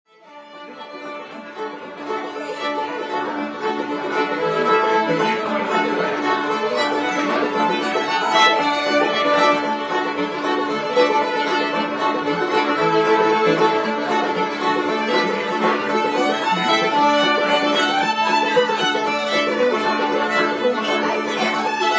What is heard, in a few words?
Hornpipe, Fiddle, Banjo, Uke; Durang's Hornpipe; Old-Time JAM